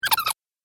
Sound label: Little Imp Chatter, monster sound effect; Little Imp Chatter 2; Horror; Andrew Fleming